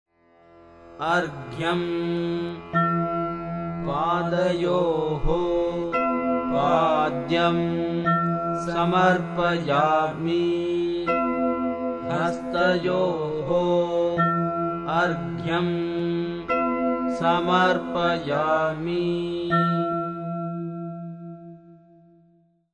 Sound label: chants, puja arghyam; atmartha puja